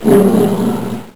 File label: Roar; Saban; Tigerzord